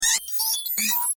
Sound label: Start-Up Melody Faulty, robot; Crafted Signals